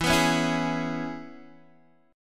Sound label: E 6th, Guitar, DADGAD